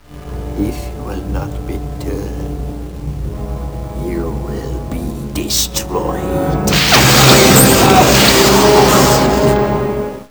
Empty Recycle Bin; emperor

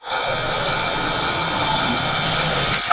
ship wings close; Earth and Beyond; EA Games

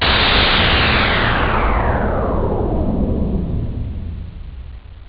takeoff

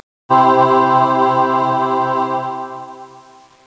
ahhh!

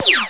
light laser; Army Men TiS

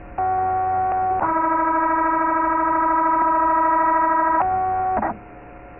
scanner sound (5MHz); Apple Desktop Media; MacWorld '89

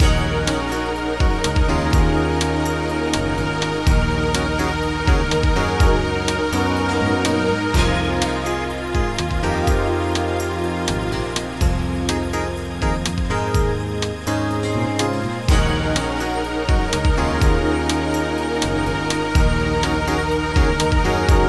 riffs, film; Ultimedia

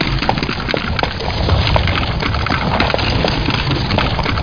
CART LONG; LORDS2

